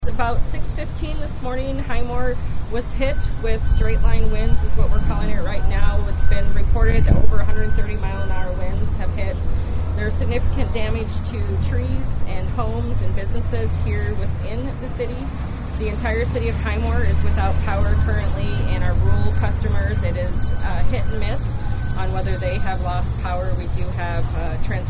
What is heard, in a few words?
high wind